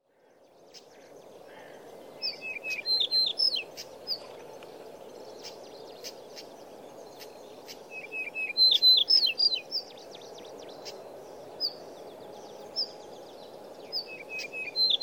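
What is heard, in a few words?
Yellow-breasted Bunting; monbetsu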